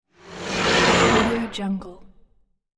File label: processed growl whoosh